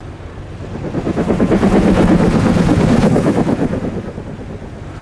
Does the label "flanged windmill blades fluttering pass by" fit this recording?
Yes